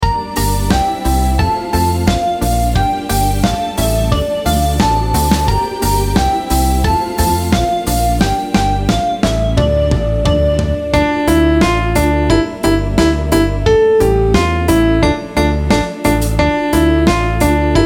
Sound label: karaoke